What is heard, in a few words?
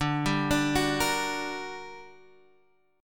G Minor Major 7th, D, guitar chord